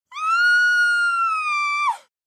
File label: Terrified female scream